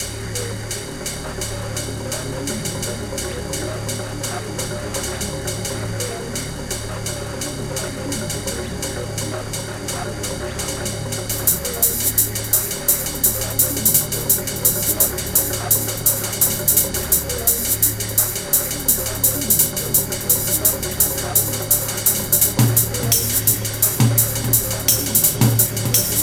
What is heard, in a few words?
agressive tribe